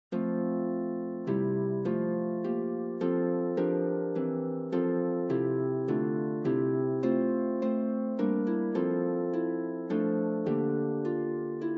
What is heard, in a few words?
Oh How You Love Me Jesus HYFRYDOL instrumental